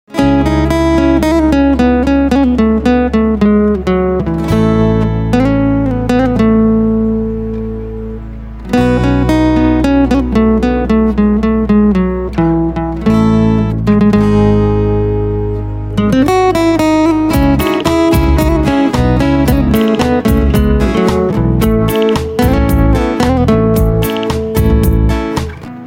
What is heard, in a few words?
Guitar